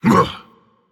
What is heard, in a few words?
Grunt Jump Dragon; fafnir